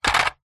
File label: leisure toy truck plastic set down